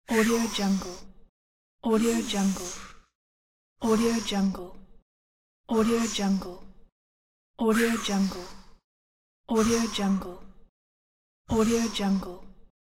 relieved exhale from female

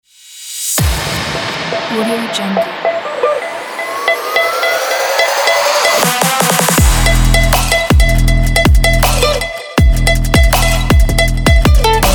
on that trap beat

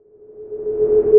eye scanner scan

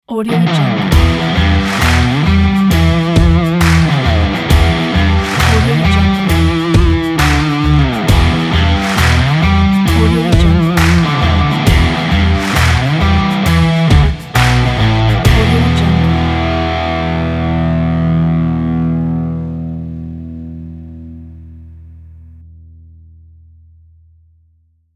stomp rock intro logo

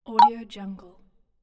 chat message

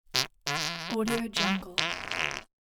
cartoon fart shy thin

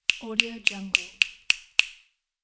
finger snap multiple

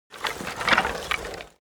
object bones rustling movement

